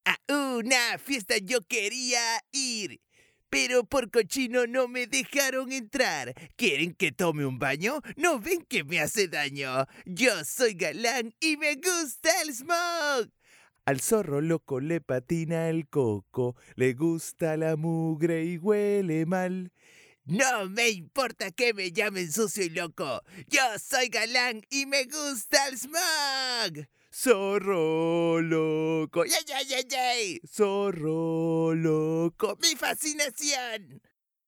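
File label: Spanish Latin American, male, singing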